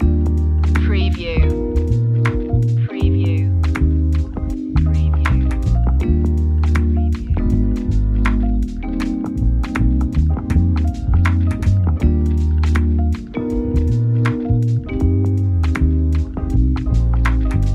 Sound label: warm vibe jazzy chill loop